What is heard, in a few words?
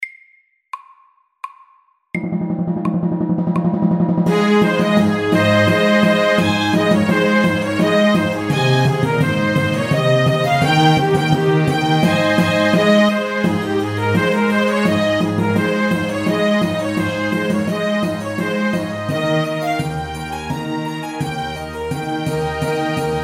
Air de trompette MIDDELS